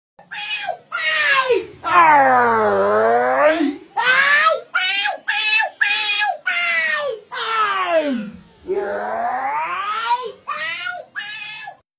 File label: aiiii aaiiii aiii